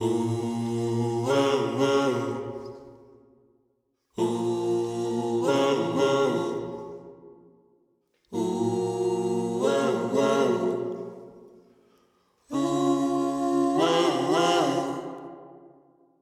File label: disco lead